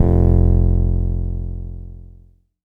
bass F1, Korg MS-20

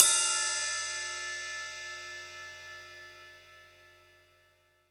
Ride; neuro; Stanza

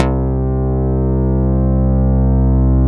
Synth Bass, Analog Bass

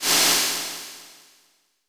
Brush Swirl, Snares; Roland BK-5

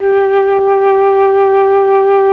FLUT1; ILIO; Propeller Island Legendary M400

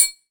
M TRIANGLE, Drum Mashines, SR-16 DRUMS; EdgeSounds

